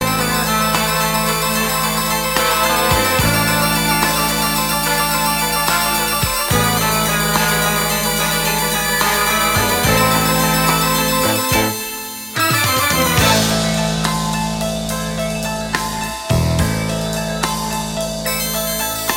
retro tone

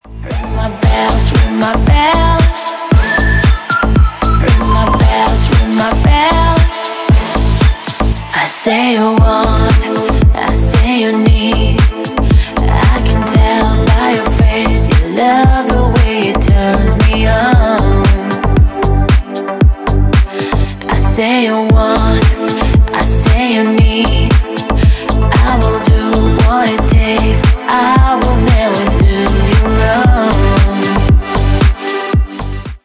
deep house remix

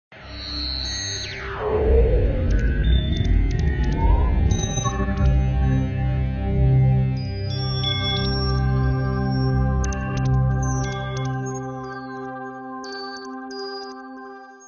digital alert

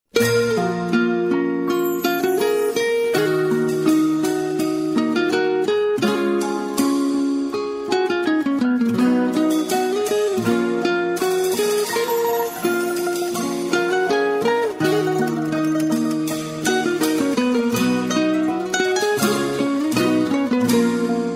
persian music

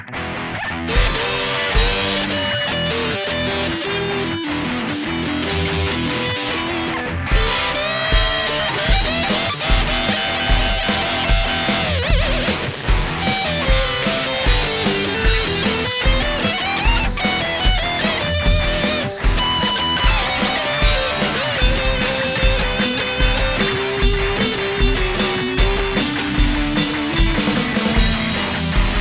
electric guitar rock

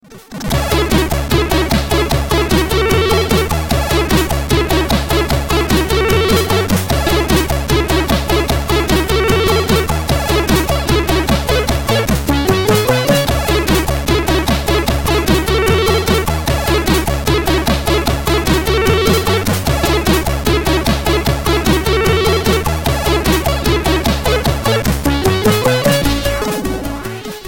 C64 intro theme; Double Dragon

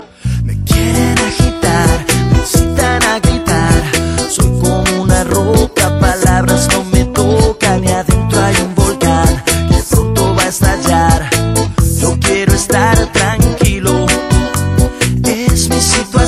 merengue

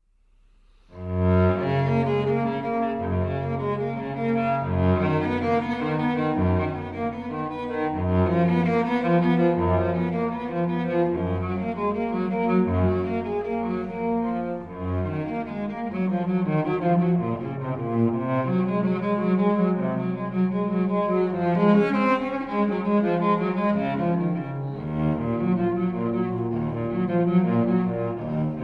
cello suite; ringtone; i s bah syuita dlya violoncheli 1

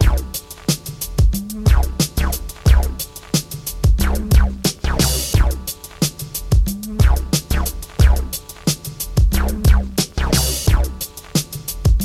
old school hip hop

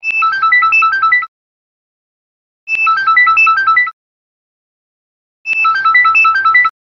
office ring

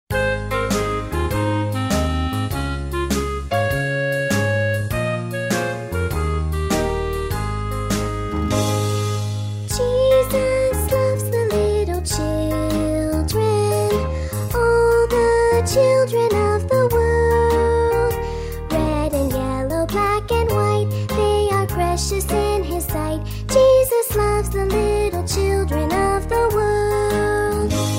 bible song

